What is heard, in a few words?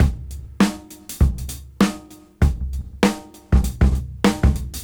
Swing 98 BPM; AMG; Keith LeBlanc Phat Butt Jams